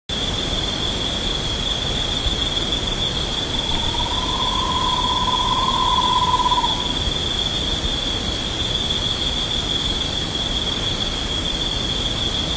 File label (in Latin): Aegolius harrisi; Pampa-del-Indio; Jorge La Grotteria